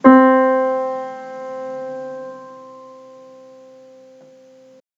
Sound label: soft middle C